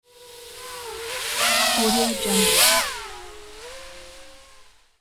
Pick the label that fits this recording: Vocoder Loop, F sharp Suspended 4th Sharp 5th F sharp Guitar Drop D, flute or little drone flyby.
little drone flyby